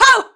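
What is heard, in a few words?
mob boss attack; Monster; Bossmob; 백발마녀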